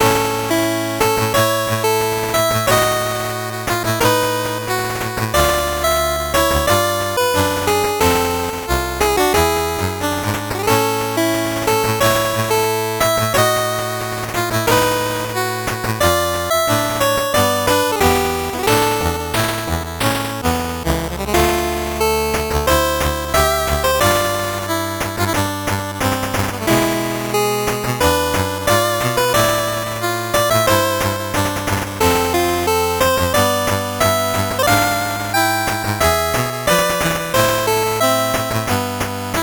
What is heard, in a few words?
8bit bgm slow